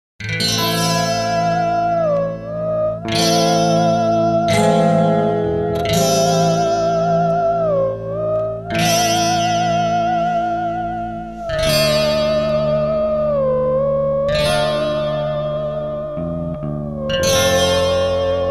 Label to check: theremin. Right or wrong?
Right